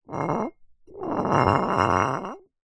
foley glass bottle 1 ltr slide slightly gritty tiled floor